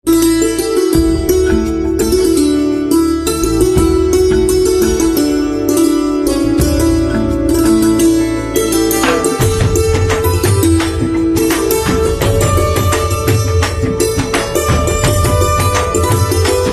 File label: Santoor Instrumental Ringtone